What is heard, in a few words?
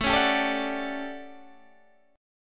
A 13th, Guitar Standard, chord; strummed